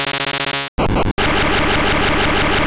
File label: Spark